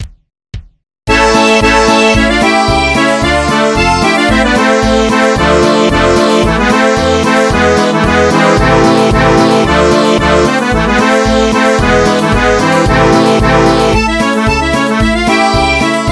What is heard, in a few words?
Russian sailor dance